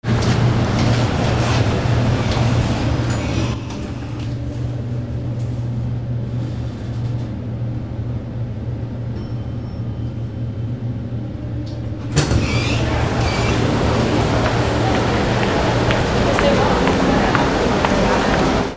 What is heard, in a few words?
AMBIENCE